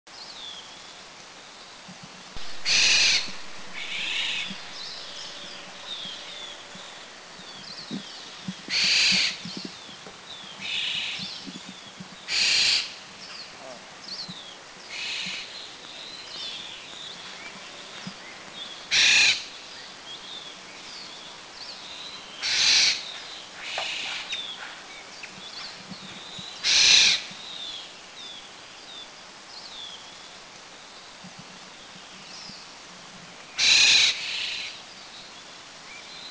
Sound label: Slender-billed Oriole, Thailand